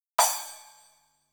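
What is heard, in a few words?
Drum Analog Kit Splash Cymbal, Yamaha RM1X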